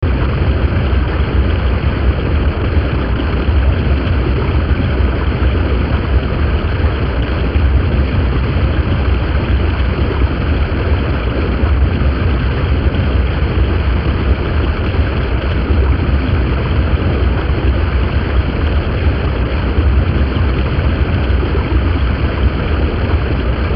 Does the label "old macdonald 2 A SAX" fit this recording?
No